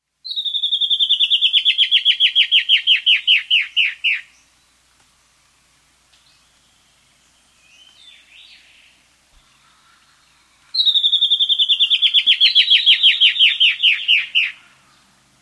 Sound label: White-Bellied Antbird; S. America